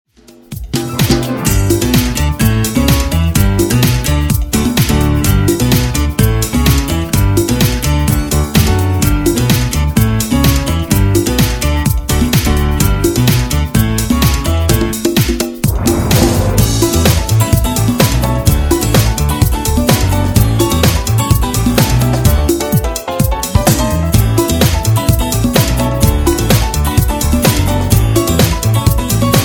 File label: saucy salsa; education; be active